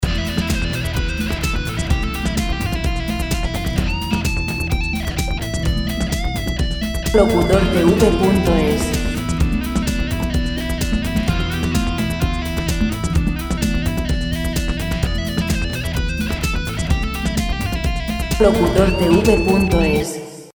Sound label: 128 rock; Rock free music